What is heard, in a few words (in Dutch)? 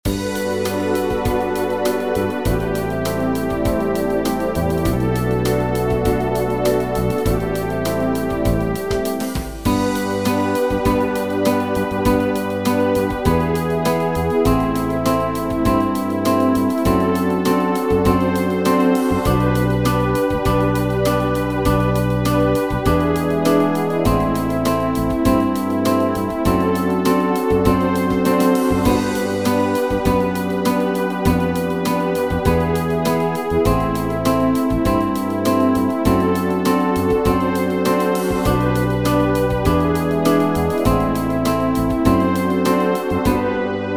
backingtrack 100bpm